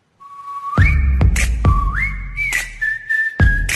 whistle tono corto

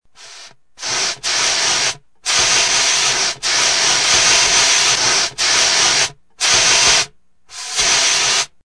Extintor de incendios

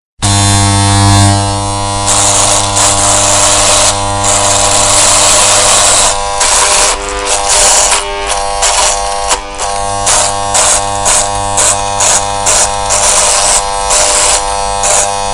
maquinilla

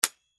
juguetes juguete

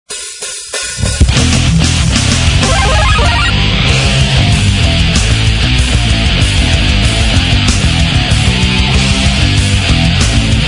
version metal; mario bross; videojuegos

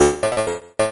la electronica

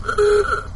coche

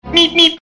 bip bip; correcaminos

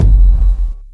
bajo

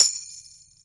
golpearon

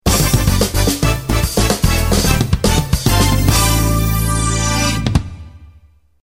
noticiero noticias

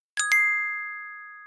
cute notificacion

